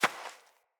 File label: Fawn Footstep; Foley